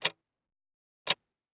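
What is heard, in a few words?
clock; hodiny; Mafie 1 cz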